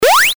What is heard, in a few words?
jump